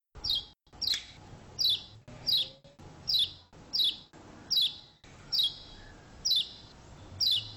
pleci lombok call

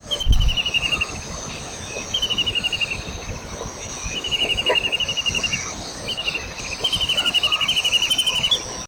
cekakak jawa

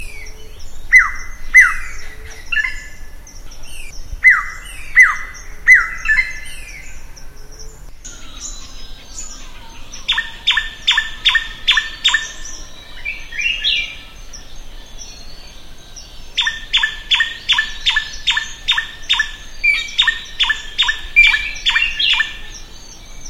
srigunting

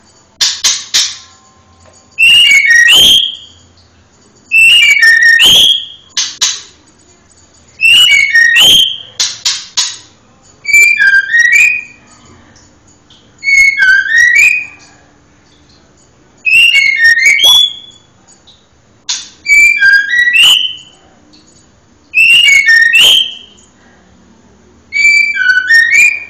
mb betina

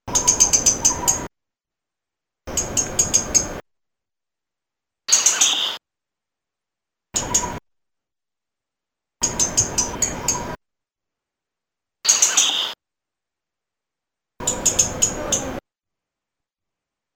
suara burung sikatan cacing betina

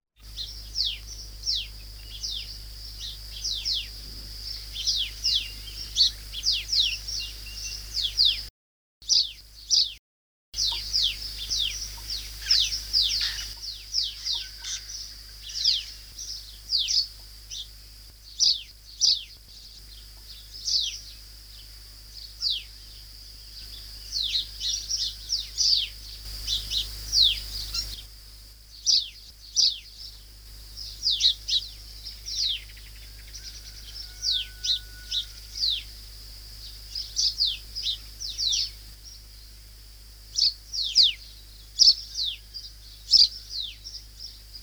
suara panggilan burung kacamata perak

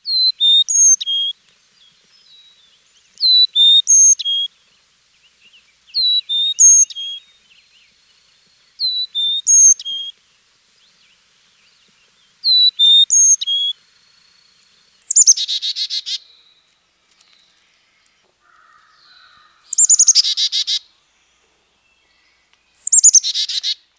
carolina kicau an call

